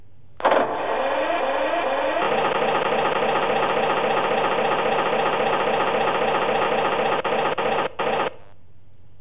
light engine perkins

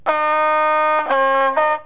light horn two tone

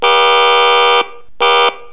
rm horn, railbus; Leyland

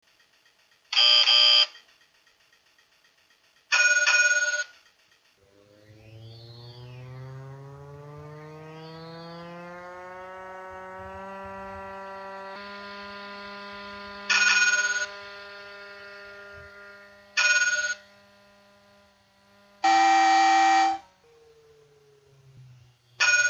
tram sounds

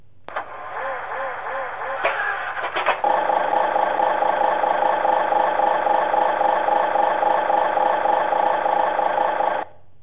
light engine whitcomb

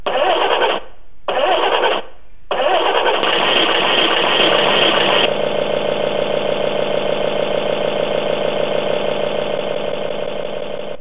rm engine, railbus; Goose #7

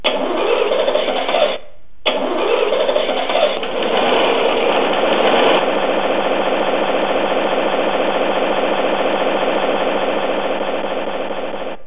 rm engine, railbus; Brill (Cummings)

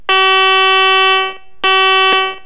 rm horn, railbus; Goose #2

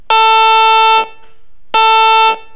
rm horn, railbus; Gulflander

